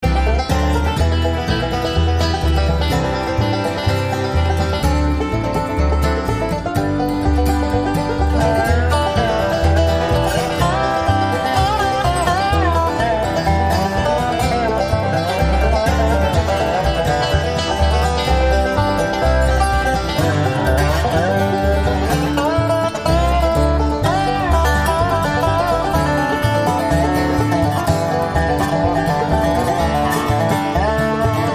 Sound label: Bluegrass